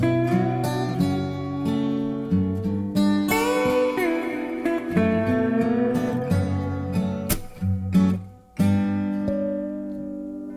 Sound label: guitar, Sad